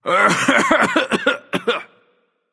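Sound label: nonverbal clear throat; smite; voicepack; merlin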